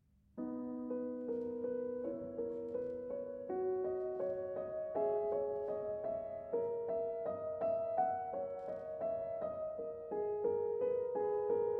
Prelude in B Major; Prelude & Fugue in B Major, BWV 868

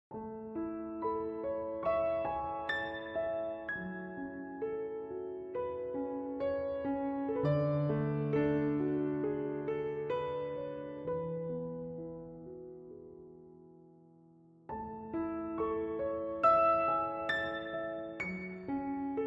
Emotional Piano

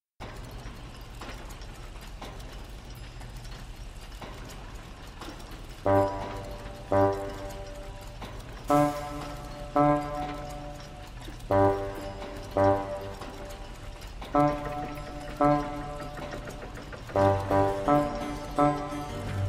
Steampunk Music

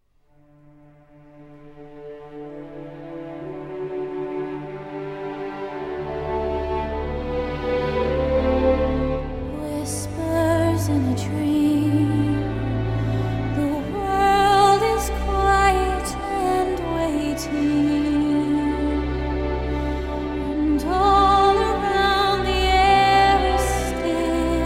Oboe

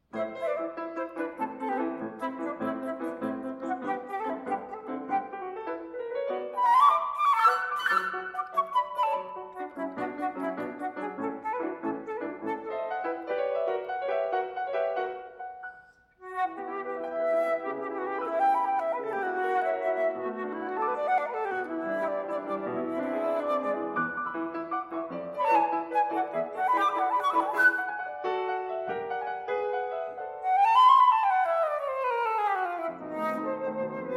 Sonata in C Sharp Minor, Scherzo-Vivace

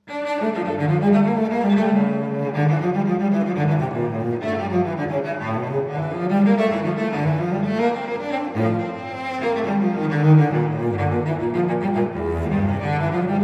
Suite in D Minor, Courante; Suite No. 2; BWV 1008

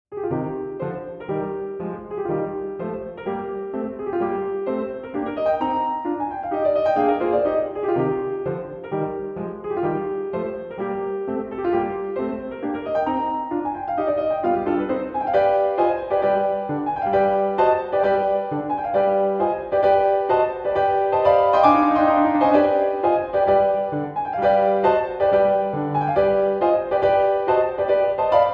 Solo Piano; Carmen; Act 3 Selections (Part I)

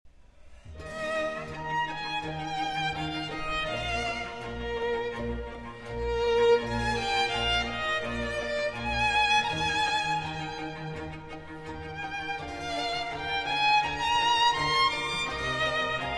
Violin Concerto in F Minor; Vivaldi; The Four Seasons; Winter; II. Largo (Live)

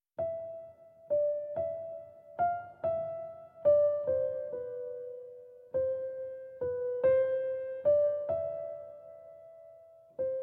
Piano Duet

